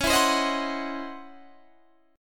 C sharp Minor Major 11th C sharp Guitar Standard; C#mM11 strummed; 21,19,22,20,19,20; JGuitar

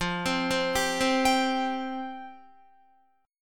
F Suspended 2nd F Guitar; Fsus2; arpeggiated; 12,15,12,12,12,15

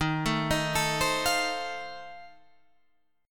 F 7th Eb

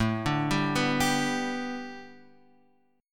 G Major A, chord

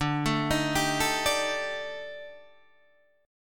D sharp Major 7th Flat 5th, D, guitar chord

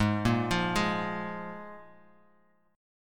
F Minor Double Flat 5th, Ab

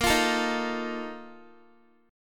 Bb Major 7th Suspended 4th, Guitar

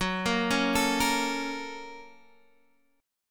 Bb Minor Major 7th Sharp 5th

